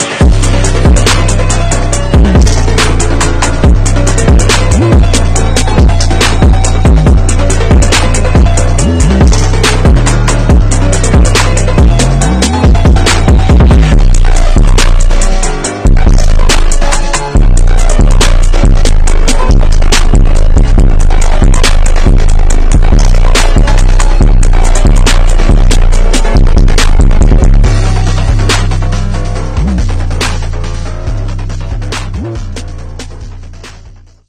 Instrumental; Drip Buster

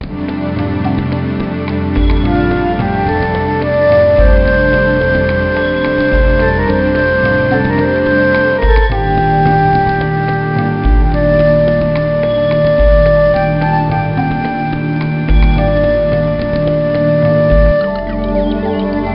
Back Loop; Touchmaster 2; NDS

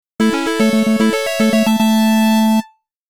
LEVEL UP! (Level Up BGM); Esper Dream 1 2 Sound Memorial

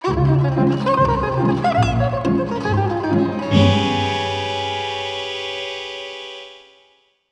Bari Sax

